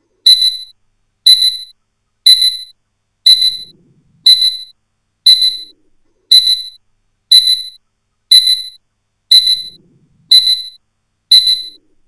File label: Pip-pip-echo